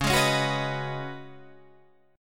C sharp 9th Suspended 4th, C sharp, down, Guitar, DADGAD